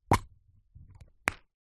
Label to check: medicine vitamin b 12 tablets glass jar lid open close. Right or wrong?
Right